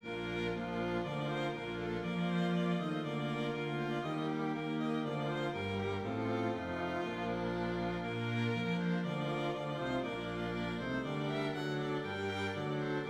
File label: Hymn Strings